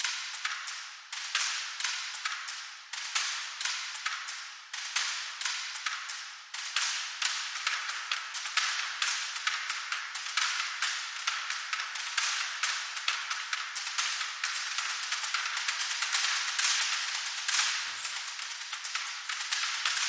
Loop Layer 5 Bamboo

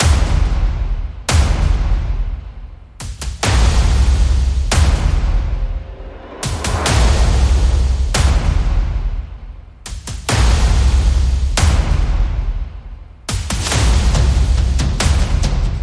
Encounter, Percussion Only

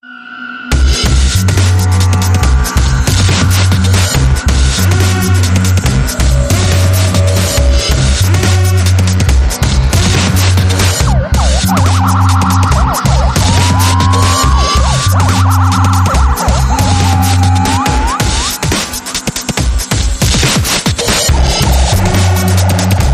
Dubstep

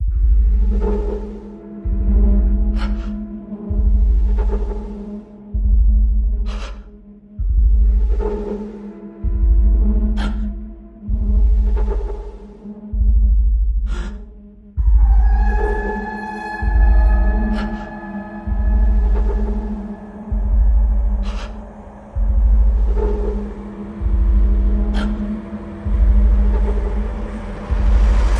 No Percussion

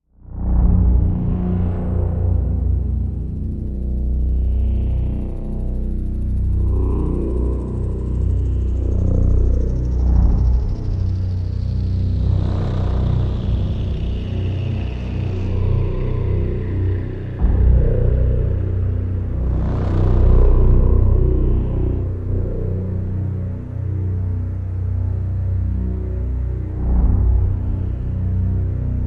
Pads Only

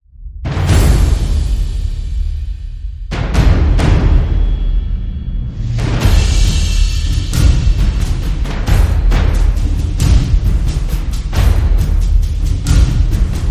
Drums

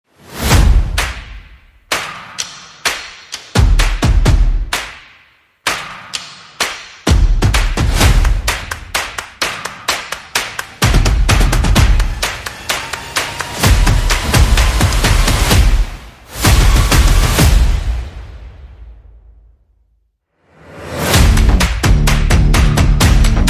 Victory Beat

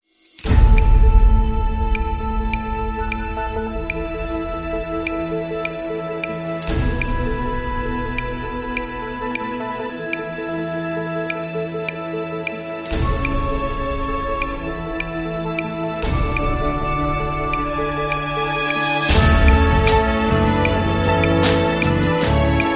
No Guitars

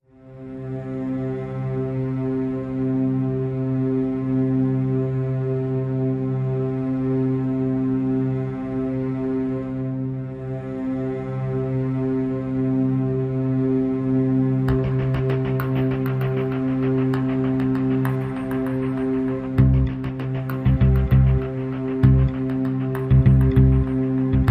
Pads and Pulses

